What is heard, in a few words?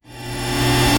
a 08 second sound of a, charge